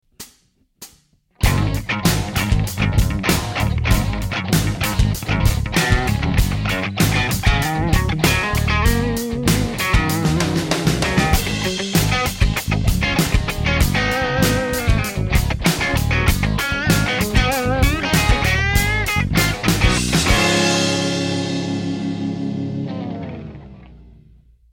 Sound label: neck clean